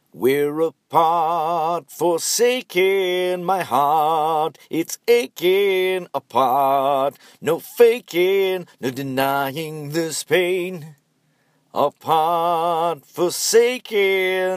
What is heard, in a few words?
Apart Chorus, dino song ideas